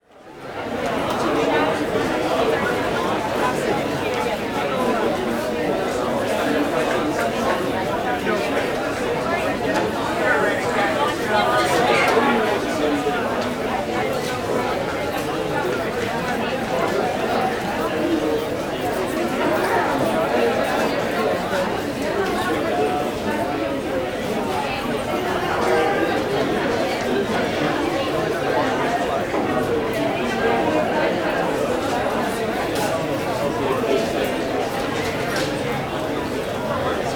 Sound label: Crowd Indoor Small Crowd Room; Walla; Sound Ideas; Walla Series